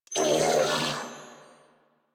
Death, Female; New War; Narmer Grineer

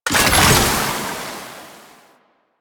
Alchemy Grenade Deposit Toxin; Warframe; Entrati

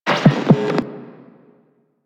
Radio Static Start B; DE; Warframe; Night Wave

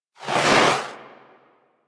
Heavy Grenade Launcher Projectile By, Weapons; Warframe; Archwing

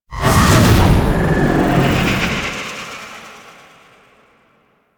Silence; Warframe; Warframes; Decree

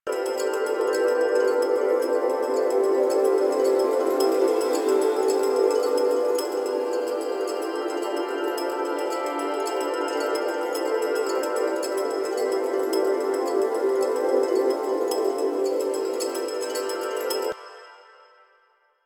Boon Menu Music Loop; Warframe; Koumei

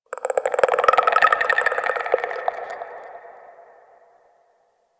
Infested Tendon Chatter; Warframe; Corpus Infested

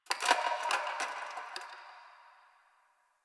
Ice Crack One Off

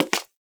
Human Loid Foley Step; Warframe

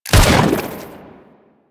Bubblegun Pistol Shot; Warframe; Weapons; Tenno

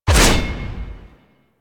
Melee Heavy Attack Short Blade Whoosh; Warframe; Weapons; Whooshes

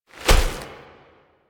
Wolf Step; Animals; Warframe; Quests; Duviri

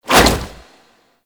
Infested Tendril Whip, melee attack; Zeke; Warframe; Infested Lich

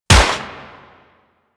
Uzi Shot; Warframe; Tenno

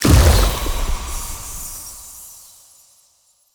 Stomp Impact; Powers; Fey; Founders Champion

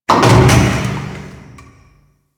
Settlement Machine One Off; Ambience; Warframe; Grineer Settlement

